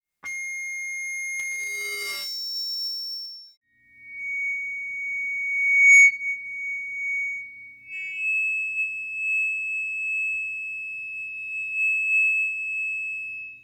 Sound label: hearing aid squeal; jungle-journey